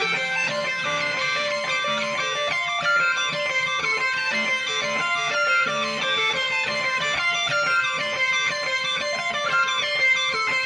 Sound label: Shift Delay, Guitars